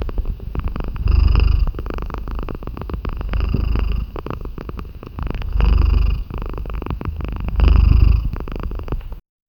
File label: a turkey purring; humorous